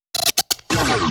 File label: Vocal Scratches 140BPM